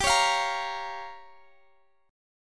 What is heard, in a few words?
G Minor 7th Flat 5th, Guitar, Open D; chordshape; down